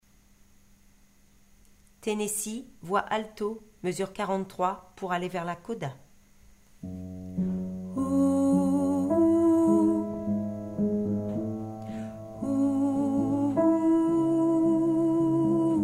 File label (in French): tennessee alto